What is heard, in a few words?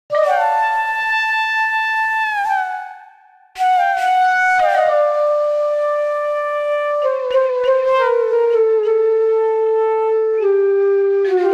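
Mysterious Bansuri, D Major Bhairav 99 bpm; MIDI Clips; Tonal; Motifs; Ableton; Factory Packs; Mood Reel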